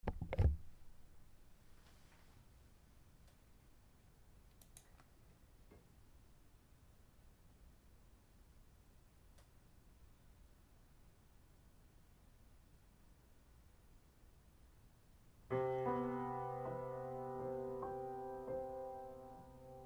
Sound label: Piano